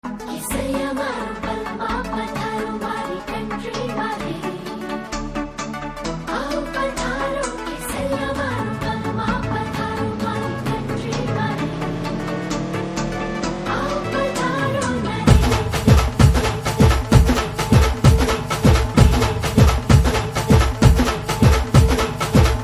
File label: Drum Beats Ringtone